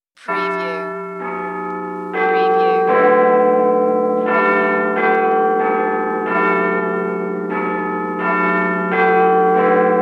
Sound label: Big Ben Strikes Midnight